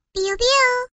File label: piu piu lovely child sound sms tone